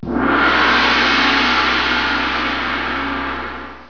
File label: GONG